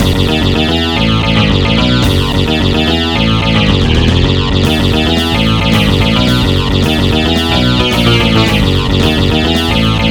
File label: Rock; Metaltech Battledrome